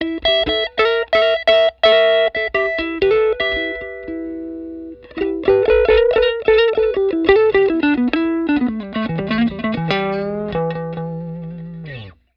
STRAT NECK LICK, Neck pickup through a 1950 Fender Deluxe; s pickup set